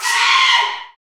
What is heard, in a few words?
Bullhorn, Afro Horns, Africa